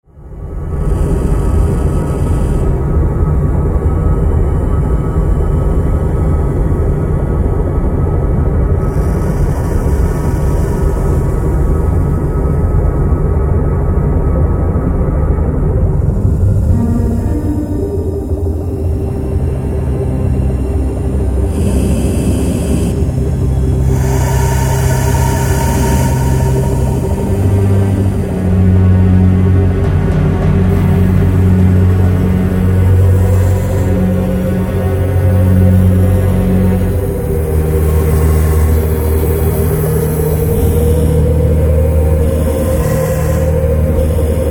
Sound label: dark strings